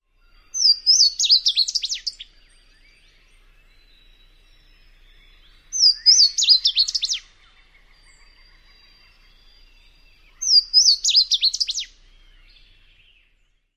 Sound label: songs; KY